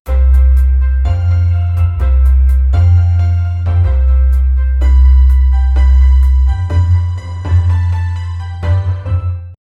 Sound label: finance video bgm inspirin